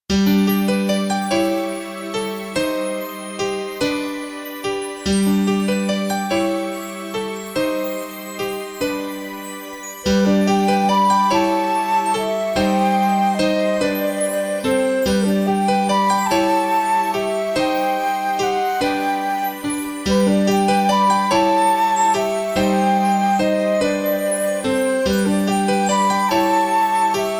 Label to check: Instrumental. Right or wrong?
Right